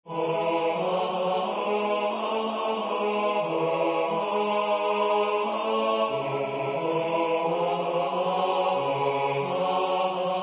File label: bas; Danmark, nu blunder den lyse nat